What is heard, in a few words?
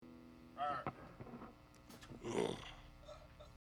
Loud Mix